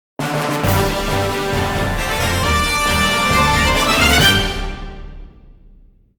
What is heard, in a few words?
Victory Theme; Zelda Series